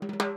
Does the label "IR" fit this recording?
No